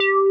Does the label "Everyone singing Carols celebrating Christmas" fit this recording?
No